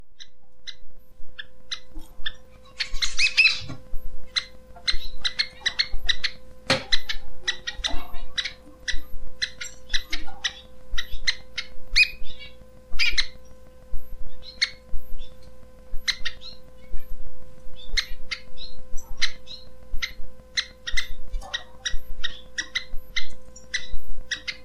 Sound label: shafttail call